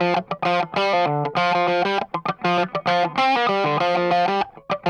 I INSIST, Funk Guitar; 90 s Sample CDs; Zero G

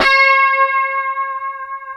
D 5 STRAT VB, HYPED STRAT, Guitars; Spectrasonics; Hans Zimmer Guitars VOL-1; 90's Sample CDs

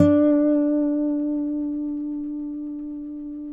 Finger D 4 R, Guitars; Spectrasonics; Hans Zimmer Guitars VOL-1

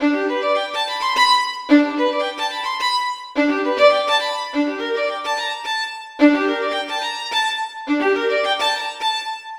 String; Sonic Foundry (Sony Creative Software); Infinite Soul VOL-2

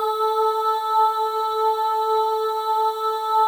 FEM OHS, Symphony of Voices; Spectrasonics

